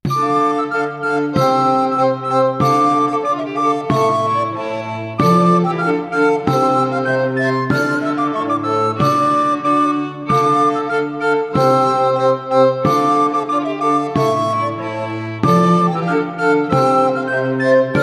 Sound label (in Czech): Renesanční tanec; Schola; Benefice pro Workshop 2000